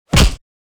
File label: kick body hit; fights bodyfalls; Asian Show Sound Effects